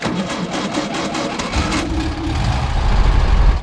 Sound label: hudson on; Mafie 1